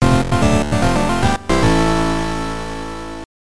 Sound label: Match Start; Nekketsu Koukou Dodgeball Bu PC Bangai Hen; TurboGrafx 16